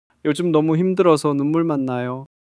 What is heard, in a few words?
crying long crying